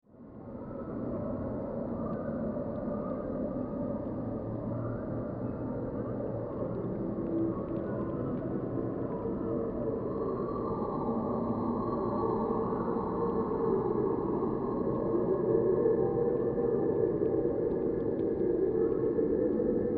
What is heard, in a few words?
Horror Enchanted Forest Critter Movement Dark Spooky Ambience; Scary Soundscape